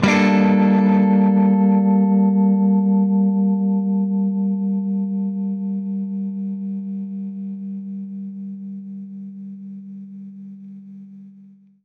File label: Baritone Chord Gmin[add9], Guitar Chords; undercover samples